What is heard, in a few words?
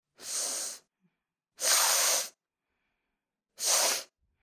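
sniffling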